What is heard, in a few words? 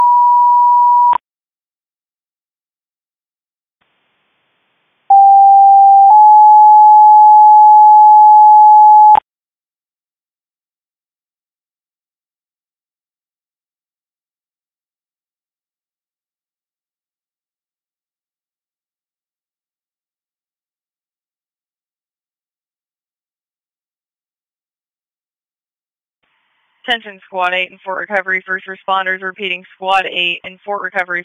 First Responders, Tone Alerts; Mercer Fort Recovery; Saint Marys